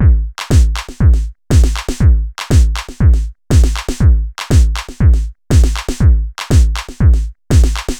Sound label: 120 trance beat, Drum & Perc Loops